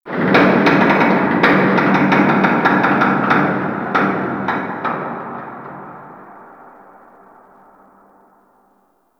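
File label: Ice Metal Distant, Ambience; Warframe; Corpus Ice Planet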